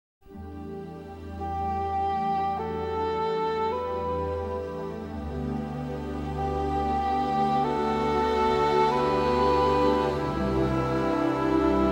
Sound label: Instrumental; Working Girl